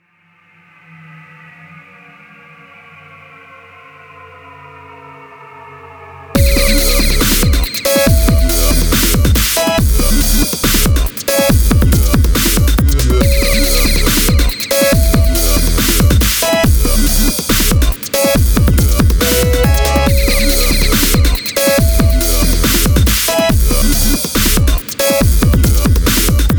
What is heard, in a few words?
Dubstep Intro